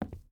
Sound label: footstep boots wood walk; Rust